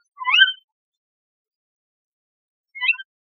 Tawny Owls creeick only; Marcot

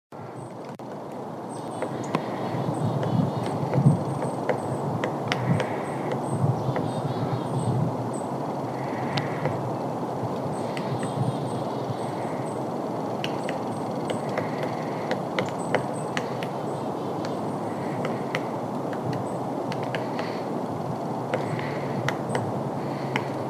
pileated woodpecker foraging taps; northwest Oregon; ORDER PICIFORMES; Often with echo quality; The loud singly-spaced bill strokes are distinctive

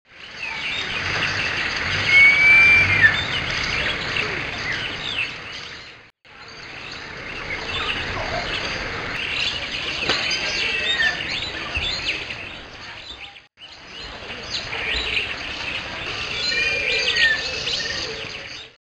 giant eagle owl, juv, Lk Baringo

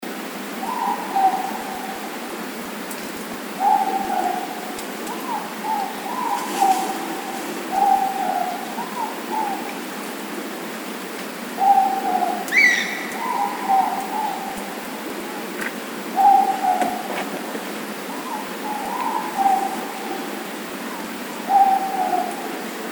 four moreporks kiwi squeal

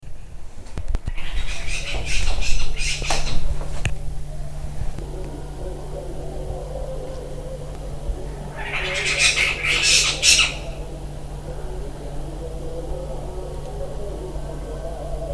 spotted owlet; spotted owlet 10

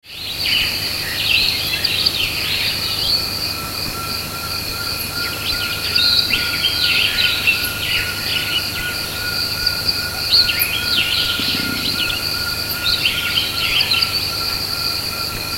pearl-spotted owlet, Lake Baringo

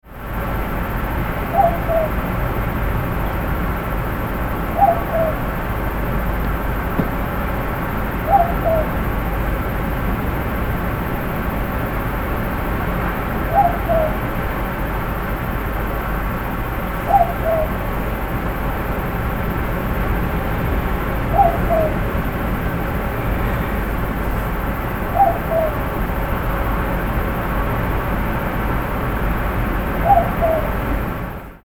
Southern Boobook, owls

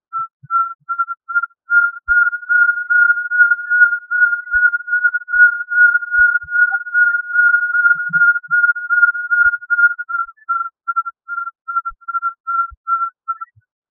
pearl-spotted owlet, Lake Baringo

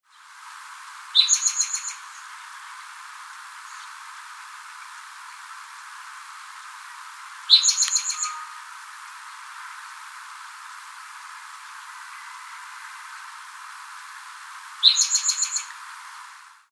spto chitter; FAMILY EMBERIZIDAE; chup chup chup chup, mostly given in breeding season

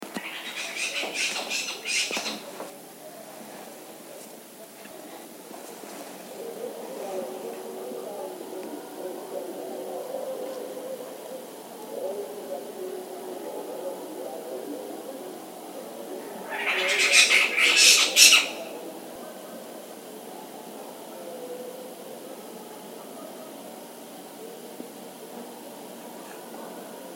spotted owlet

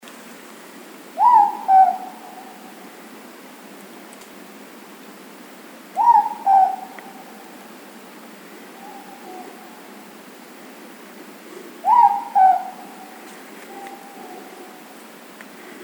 morepork, owls

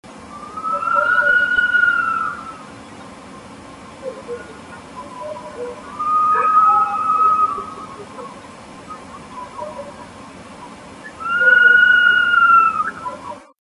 Strix ocellata

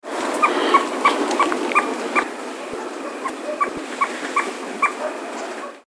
juv chitters

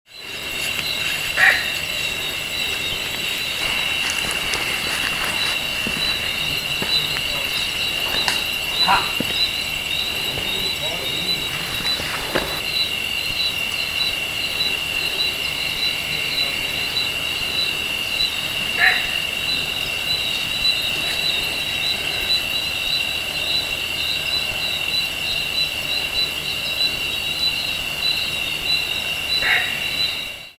giant eagle owl juv, Lk Baringo, night

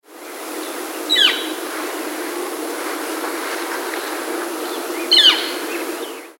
Northern Flicker, two kleer calls; A sharp peah, given by adults or young (F).

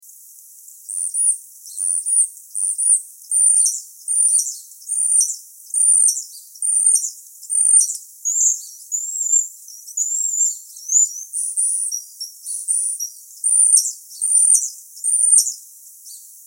European Starling; FAMILY STURNIDAE; Example from northwest Oregon; often includes imitations of other birds' calls; Common call a harsh chatter

